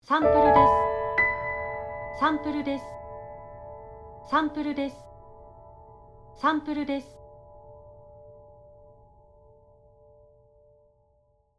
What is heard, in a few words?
Logo Piano high